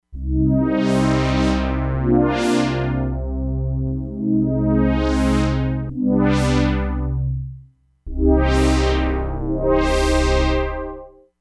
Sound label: SAWTOOTH BACKGROUNG W. DYNAMIC ATTACK, Crumar Bit 99; Factory Programs